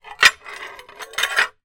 foley glass piece thick broken movement on another; zapsplat